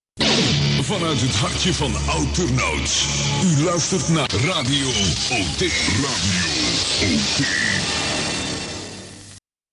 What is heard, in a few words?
jingle; OTd